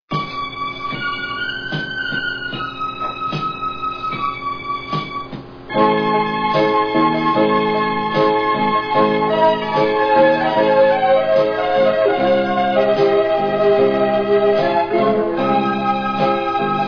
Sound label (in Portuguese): orgao